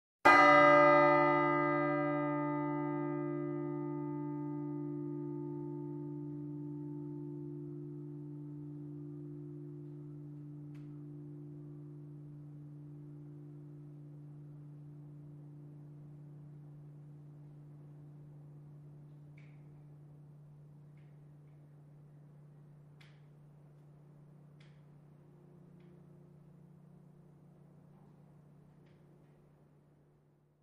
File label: Bell Tower Single Low